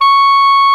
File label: SOP SAX C#6; Greytsounds VOL-1